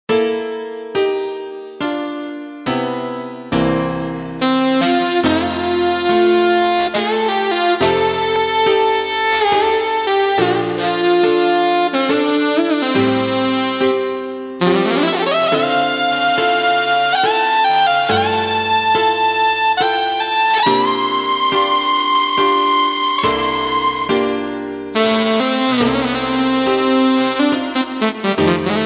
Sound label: Amazing Grace Alt Sax